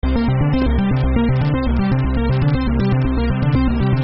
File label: ARP